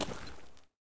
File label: sand footstep; metrocraft